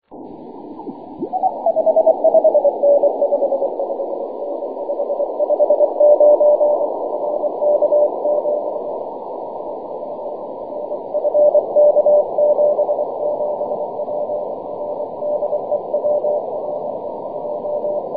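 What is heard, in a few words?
6m continuous wave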